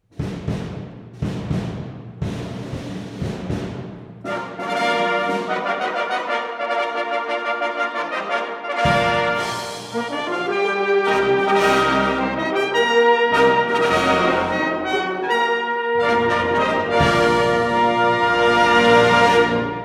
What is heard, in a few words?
Fanfare; The concert "A night at the movies"